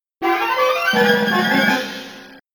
Mine Cart Suspense; Indiana Jones The Pinball Adventure; Williams; arcade

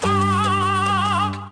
fly

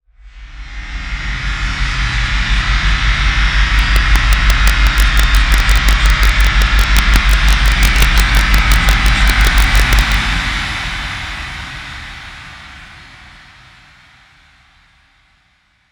Horror Initial; Among the Sleep

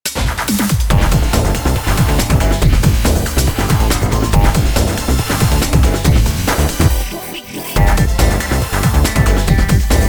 Bgm Strongest

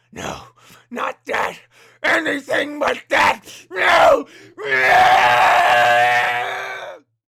Clown Scream

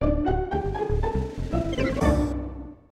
Fortress of Fear Realm Fanfare; Glover; Nintendo 64

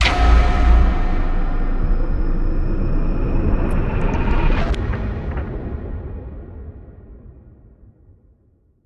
sfx ui start menu; Hellpoint

